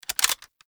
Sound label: Foley Weapon Swap Accent Pistol; Warframe; Characters; Kahl